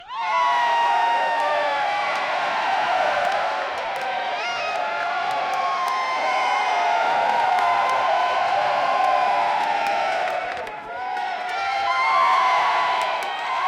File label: cavern